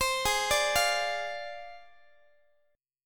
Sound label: Ab 6th Flat 5th Ab, Guitar; x,x,15,18,18,16; arpeggiated